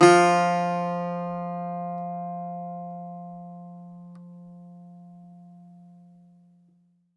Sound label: Greek Bazouki, Plucked Strings